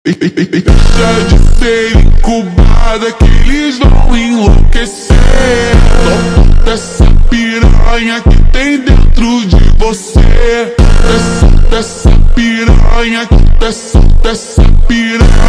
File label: Automotivo slowed Reverb, Phonk